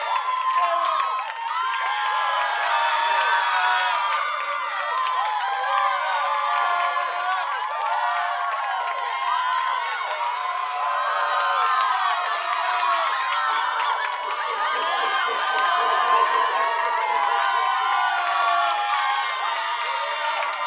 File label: Crowd Cheer Loop